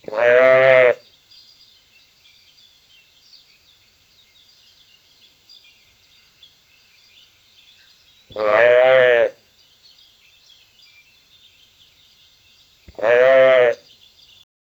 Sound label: a cross between a walrus; alligator